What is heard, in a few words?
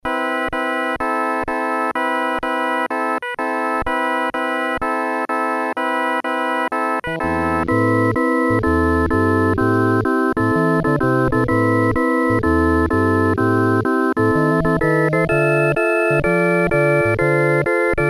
popswing